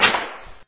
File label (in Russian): tyazhelij udar